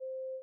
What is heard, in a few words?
audition tone; Falling Metal Interactive; Blacksite; editor